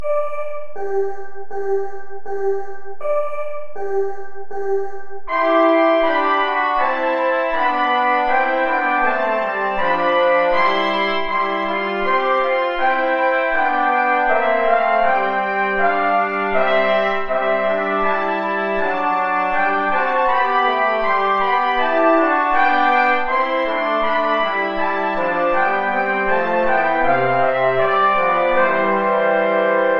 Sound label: play along without trombone